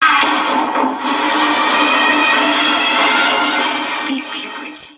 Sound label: Beep Beep